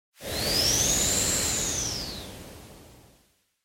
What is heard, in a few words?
Wind Synthetic